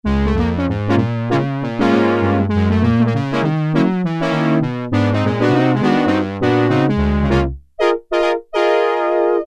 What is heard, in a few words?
Sax Ensemble, Korg Poly-61M